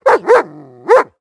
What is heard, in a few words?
dog; World of Tanks Blitz; hangar 9 may